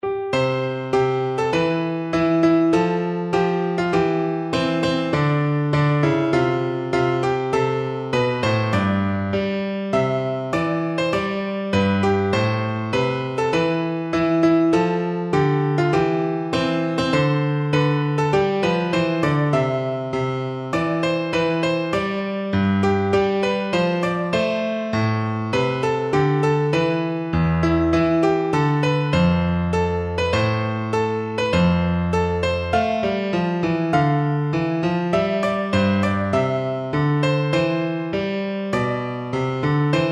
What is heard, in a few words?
national anthem russia piano